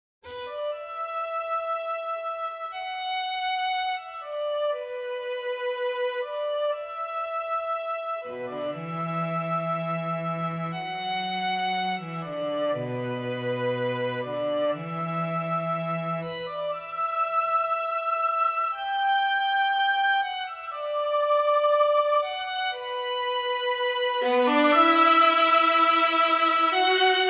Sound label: String Quartet in B minor